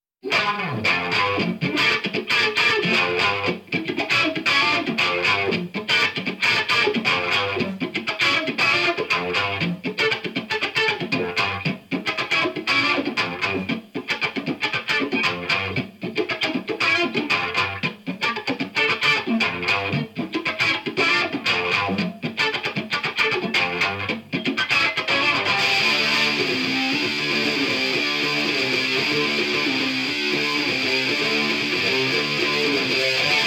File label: guitar